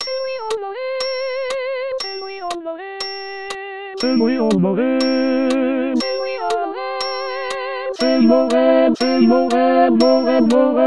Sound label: african noel